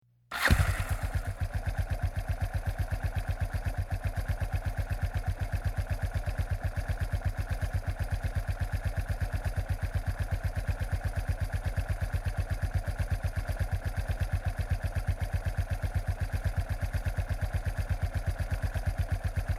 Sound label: Motorbike Quadbike 400Cc Start Engine Run Idol Ticks Over Stop; Transport